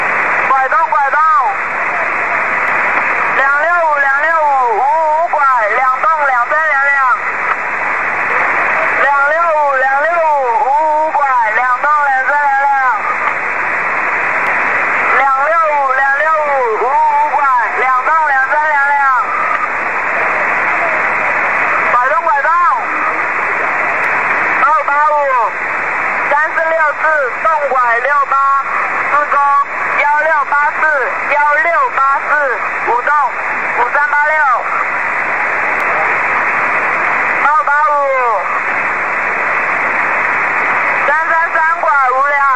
7777kHz

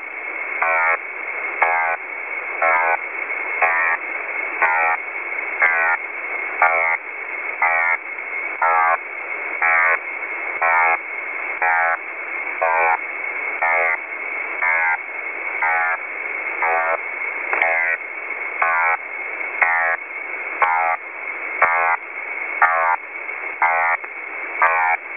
buzzer 8365khz; New Buzzer variant 1

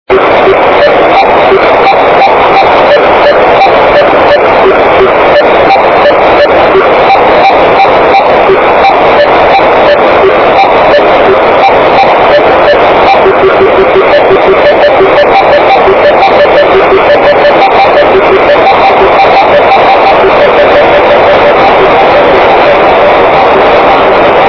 polytone

